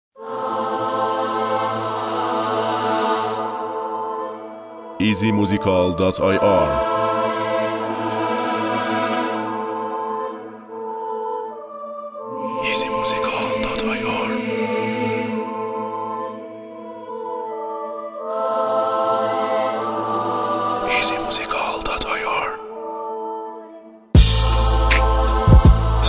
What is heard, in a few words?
Beat